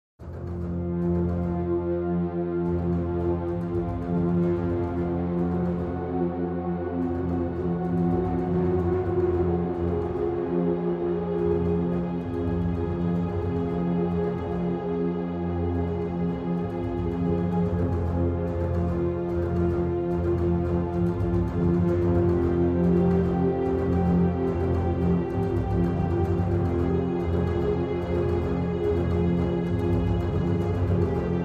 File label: Strings Only